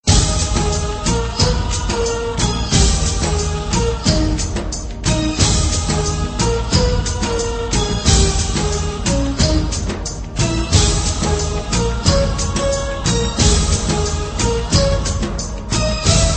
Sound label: Classical